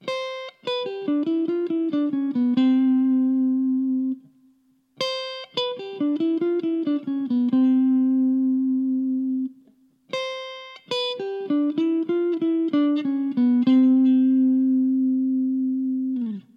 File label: Major Scale Lick